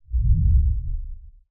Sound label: LFE, Rumble, Pulse